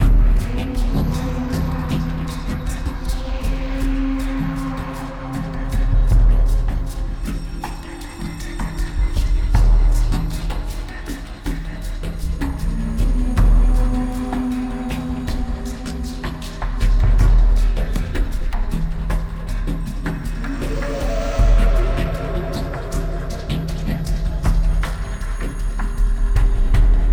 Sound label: Combat Anticipation Hard; Eden Rising